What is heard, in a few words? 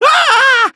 aaah